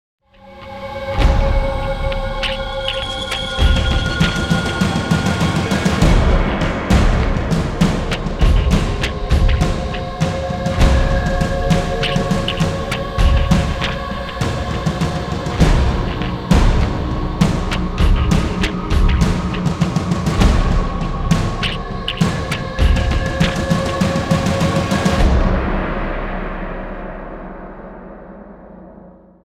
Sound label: TRIBAL